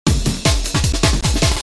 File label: Sample Loop